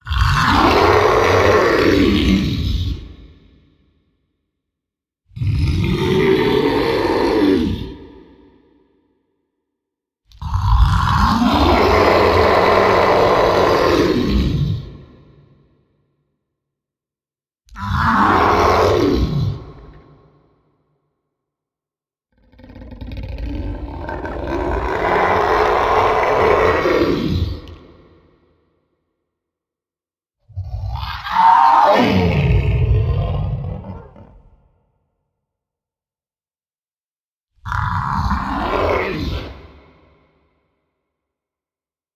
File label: Monster Screams